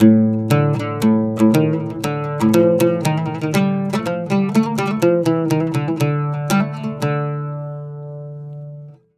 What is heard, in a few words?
120 OUD, Mediteranean Traditions